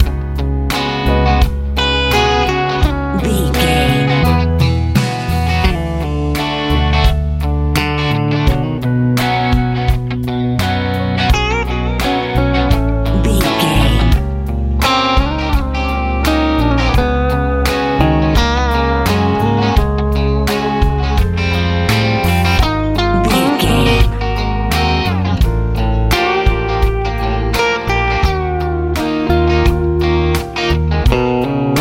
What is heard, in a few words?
warm fun easy listening pop